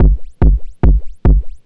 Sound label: Kick Loop, Drums, Dance Beats